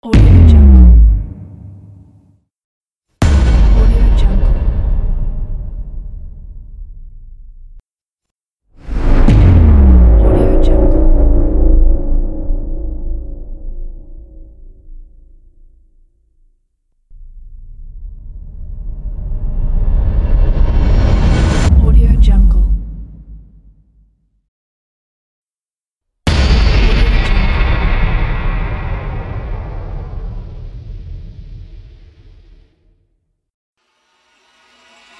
epic trailer hits

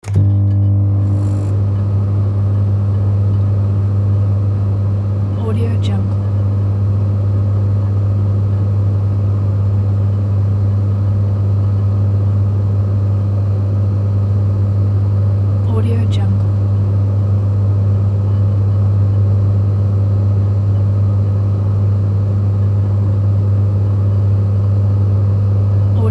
microwave oven

